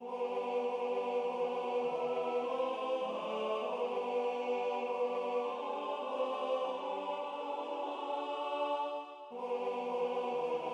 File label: Tenor